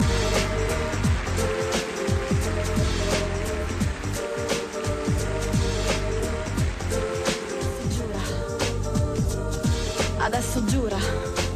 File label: love music